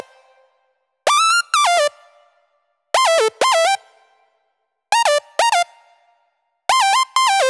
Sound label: 128BPM Melody Root D; Uprising; Vengeance Samples; Uprising Kit